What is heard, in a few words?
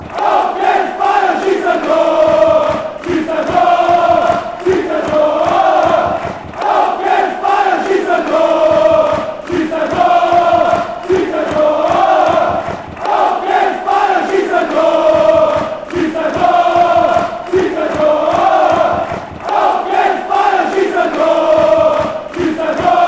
score a goal, fan chants